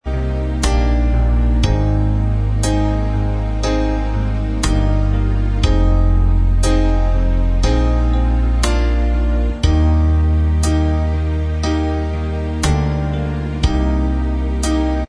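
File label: instrumental track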